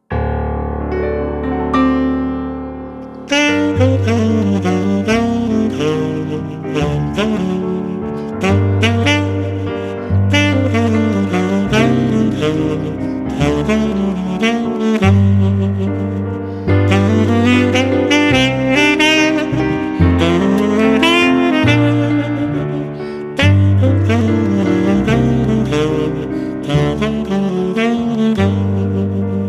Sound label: swing